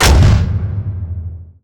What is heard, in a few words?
laser intro wall destruction; Portal 2